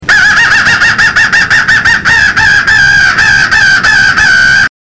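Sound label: fighting, The Fighting Call, crow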